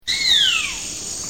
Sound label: crane hawk